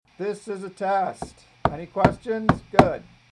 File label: clap